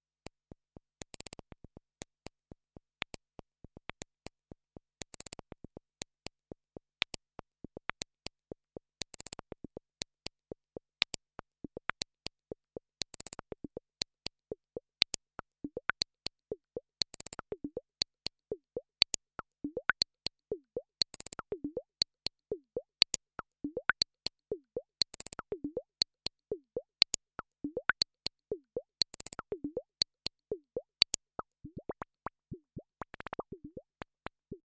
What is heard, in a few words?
LP Filter